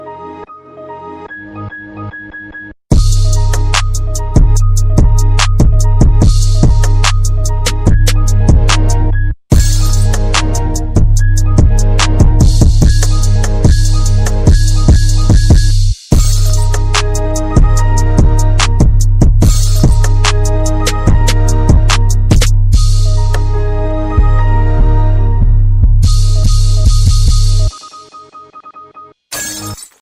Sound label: Trap Remix; Windows